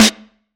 DnB Drum Hit Snare; drumnbass 170bpm; Drum Kits; musicradar

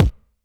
DnB Kick; drumnbass 170bpm; Drum Kits; musicradar; Drum Hits B

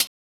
Closed hat, techno drum

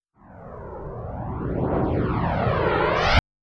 Phaser Noize, techno drum fx; Single Hits

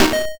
YM Synth D#; SYNTHS; CHIPSHOP DELUXE; CHIPSHOP HITS

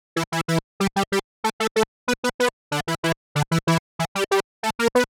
Synth Line 94,hiphop samples; 94bpm; musicradar

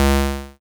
GB Bass C#, chip; chip hits; CHIPSHOP HITS

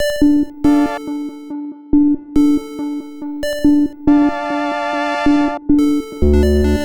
140 Synth D, 140 BPM; loops; CHIPSHOP DELUXE

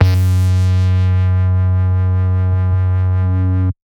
Tech Bass F#1, techno 120bpm; musicradar; Bass Multi

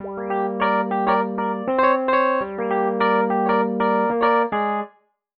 Keys Line 100 G, 100bpm, hiphop; musicradar

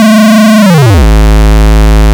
FX Up Down; SFX; YM; CHIPSHOP DELUXE; CHIPSHOP HITS

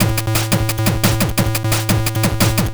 Chip Shop 175 Combo A; 175 BPM; CHIPSHOP DELUXE; CHIPSHOP LOOPS

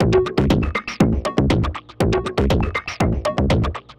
Tech Beat 120 fx, techno; musicradar; 120bpm; Beats

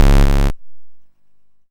YM Kick, DRUMS; KICKS; ChipShop Deluxe; ChipShop Hits

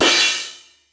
Jomox Cymbal

